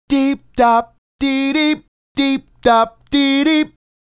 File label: Male Solo, Hip-Hop and RnB Vocals